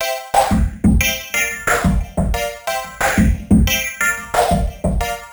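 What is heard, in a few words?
Tech Sequence, звук синтезатора хип-хоп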